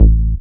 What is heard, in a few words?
DR. LOOP, BASS; X-Static Goldmine 3